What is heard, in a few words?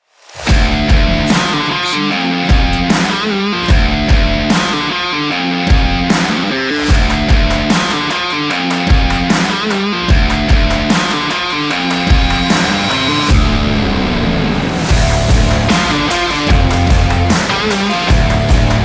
Epic Rock Sport Trailer 75BPM Dm